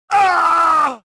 pain; rebel

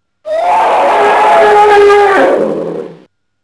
elephant die; animal; Tony Hawk's Project 8; XBOX

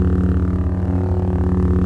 saber hum; weapons

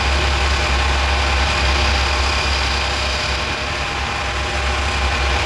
train idle; Soldier Of Fortune II Double Helix; XBOX; ambience

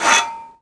grind metal off, terrains; Tony Hawk's Project 8; XBOX

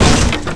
hit traffic barrier; hits; Tony Hawk's Project 8; XBOX

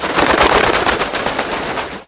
bird; Chase Hollywood Stunt Driver; XBOX

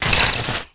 wire land spark; terrains; Tony Hawk's Project 8; XBOX

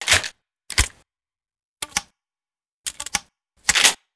12 Gauge Reload; Weapons

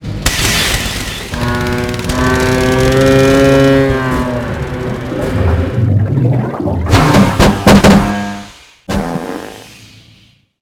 Fart Symphony; Cars; XBOX

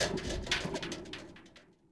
suspension cables move

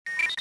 fuelpump

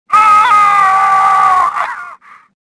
death; stofficer

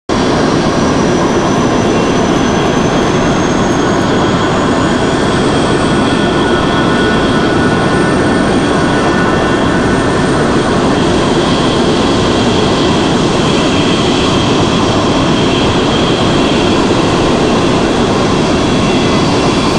Drop Ship; XBOX; Aliens Vs Predator Extinction

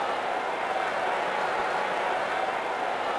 base crowd; MX Unleashed

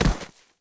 bodyfall grass, foley

